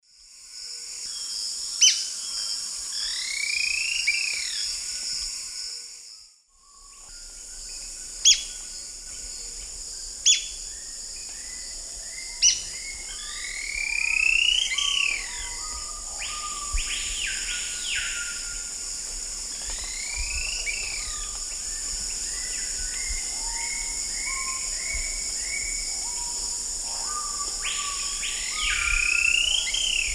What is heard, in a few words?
Platyrinchus platyrhynchos, calls and song; S. America; Eastern Lowlands